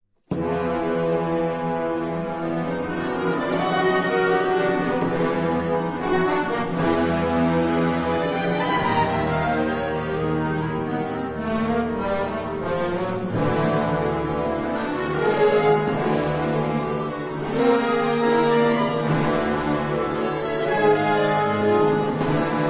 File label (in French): Concerto Pour Grand Orchestre d Harmonie Lyrique